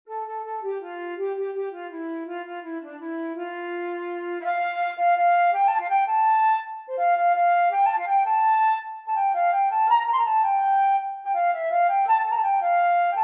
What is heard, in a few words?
Flute